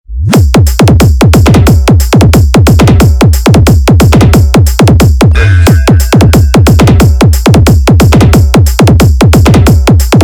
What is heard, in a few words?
Killer Beat Hard Vibration Mix; Compitition Killer Beat; Roadshow King